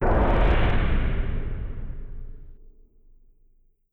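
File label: Collision Interior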